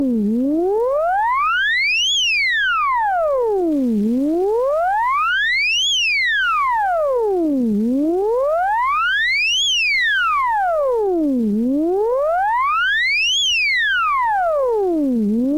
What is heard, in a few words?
Swept Tone